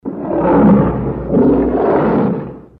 Tiger Roar; WQAM

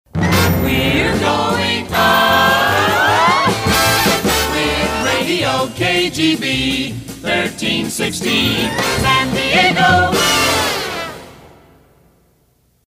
Riding High; PAMS Numbered Series

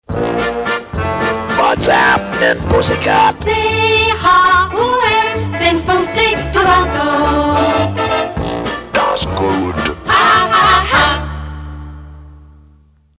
German Sig; CHUM; PAMS

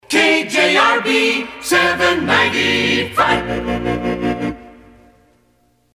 Short Full Sig Fun; KJRB

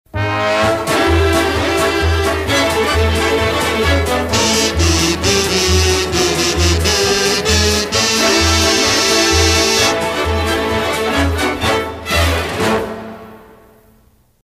Uptown Hoedown Instrumental; WPTR; PAMS; PAMS Numbered Series Samples

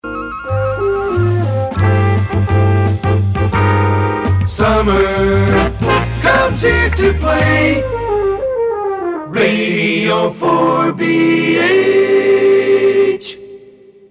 Summer Promo; 4BH; PAMS

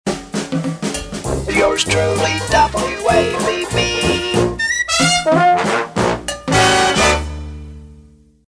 Dixieland Sonovox; WABB

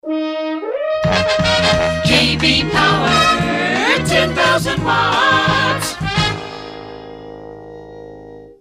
Brass Trailer; WKBA; PAMS

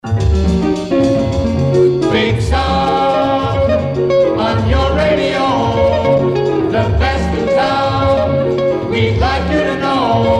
Jingle Big Sound; WQAM; JingleMill; WQAM jingle packages

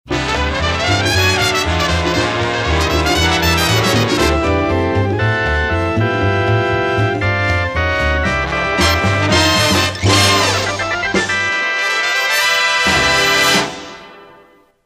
Swingin Organ Instrumental; PAMS Numbered Series

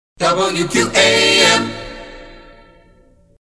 Aca Shout; WQAM Acapella Shout

